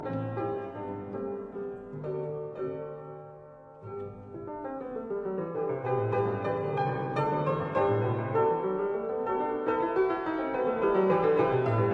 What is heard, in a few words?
Piano Sonata No 2 in A flat major Rondo Moderato e molto grazioso; Op 39